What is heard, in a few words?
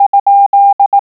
question mark, morse